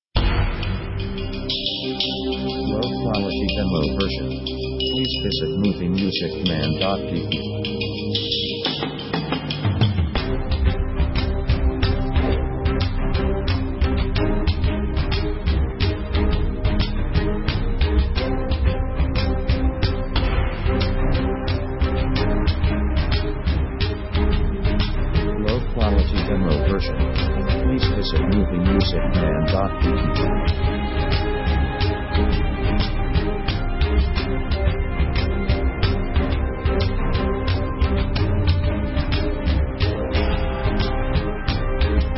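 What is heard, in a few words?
orchestra heavy action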